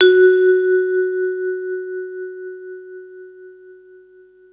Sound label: mallets